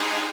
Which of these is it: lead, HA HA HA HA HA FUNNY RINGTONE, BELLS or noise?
lead